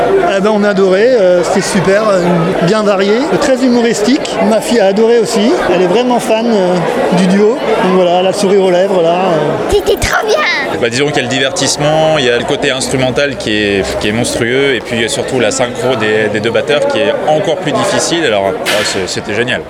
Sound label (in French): public; grand theatre